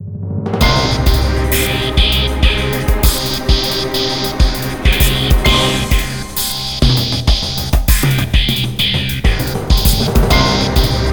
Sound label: music boss